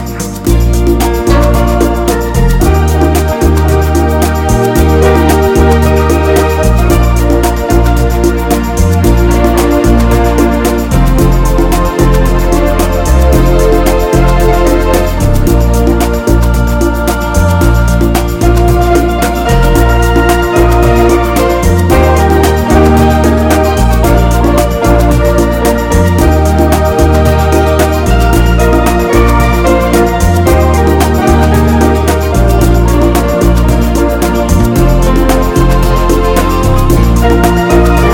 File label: Very Modern Pop